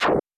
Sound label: Contort; Super Smash Bros